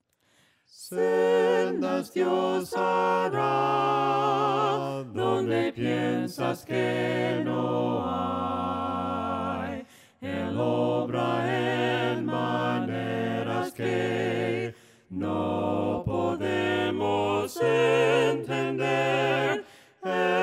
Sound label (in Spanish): VOCES